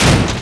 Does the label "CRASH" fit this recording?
Yes